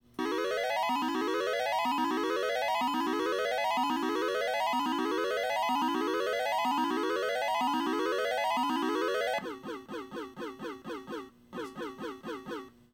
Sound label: Slot Machine; Sfx Producer